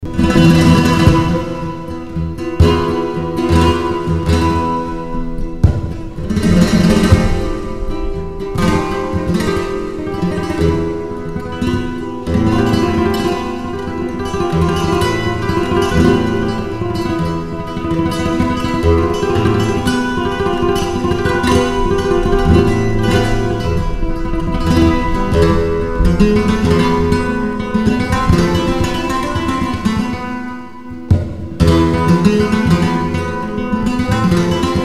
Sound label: solea, flamenco